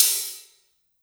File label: Hihat Open, drums; One Shots